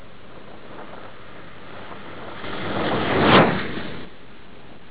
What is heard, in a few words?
swoosh